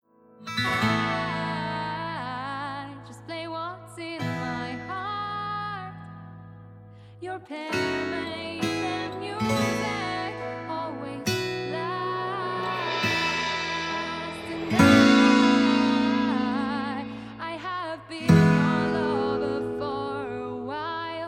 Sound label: NASH ACU MELLOTRON